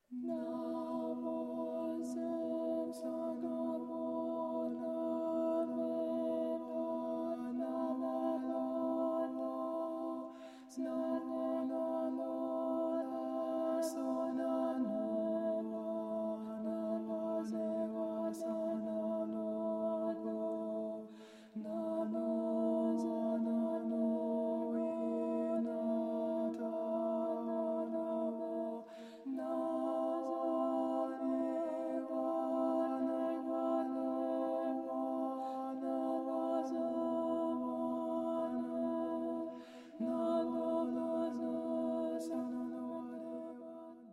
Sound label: Chant